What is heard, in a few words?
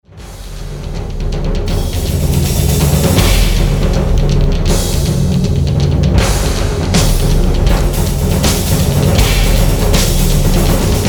Epic Drums